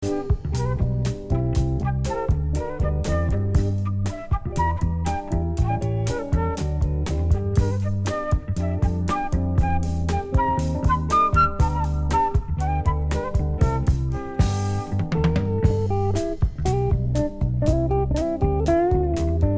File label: Music Flute